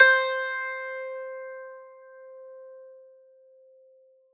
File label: c4 Pick, strings